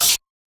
snake attack

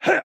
man attack light; soundscrate

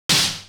lightsword lightsword clash; soundscrate

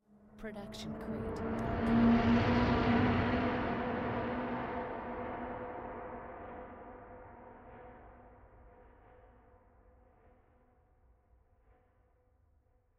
Mysterious Riser; soundscrate

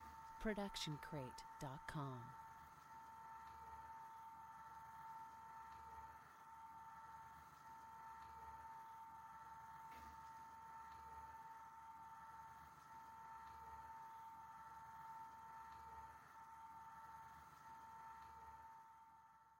VHS playing

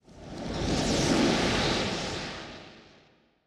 fiery missile; soundscrate

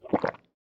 gulp; soundscrate

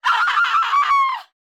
woman injured scream

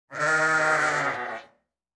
sheep

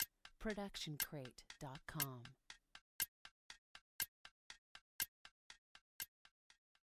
Mechanic Clock Ticking; soundscrate

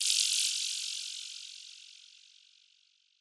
Quirky Retro Synth Burst; soundscrate

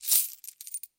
coin sack drop; soundscrate

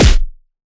Anime Super Punch

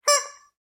Squeaky Toy; SoundsCrate

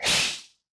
snake attack

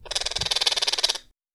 exotic bird sounds; soundscrate

